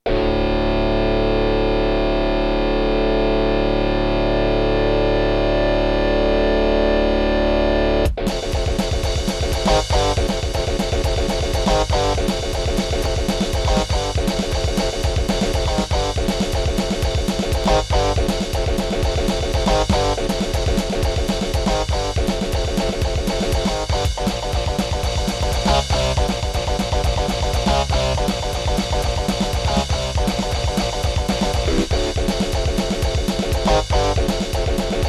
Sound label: gus pnp; Gravis Ultrasound PNP (classic patches); soundcard test; Doom